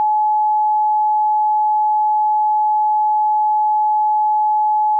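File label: sine 852 Hz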